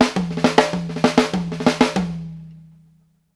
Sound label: STANDARD3 L, Drumworks FILLS 96; Sampleheads - New York City Drumworks VOL-1